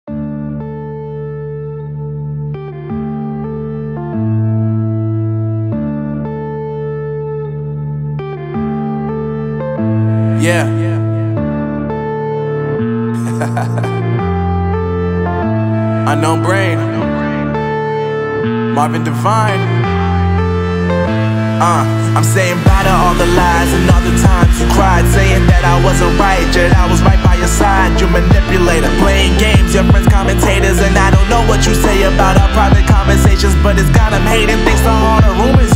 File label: trap